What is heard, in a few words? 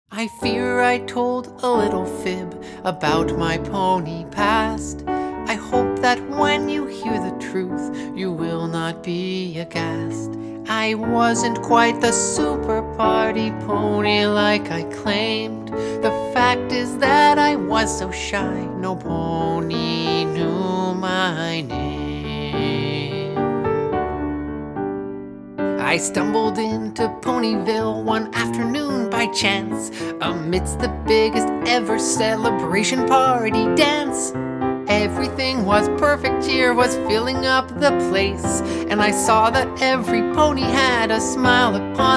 Piano; My Little Pony Friendship is Magic